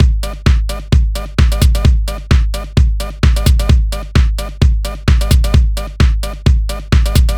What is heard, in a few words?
The Rave, 130 BPM breaks loop; loops; Loopmasters; Jon Carter & Alex Blanco; Block Party Essentials